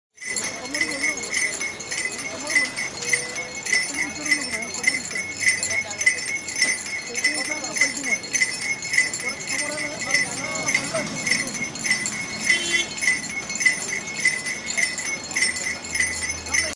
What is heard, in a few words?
noisy sugarcane juicer